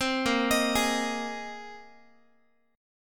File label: A sharp 7th Suspended 2nd Suspended 4th, down, Guitar; arpeggiated; 15,18,18,18,x,x; chord; JGuitar